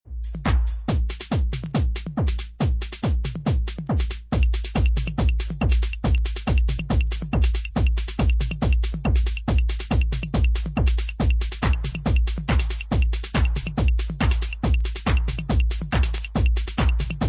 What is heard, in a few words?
kick